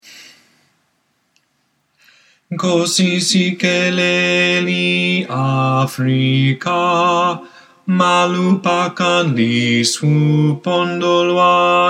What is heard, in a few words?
Bass; Nkosi Sikelel iAfrica